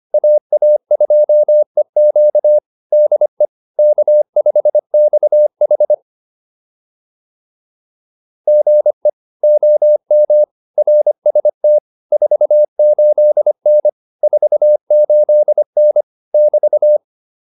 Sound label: QSO, advanced practice files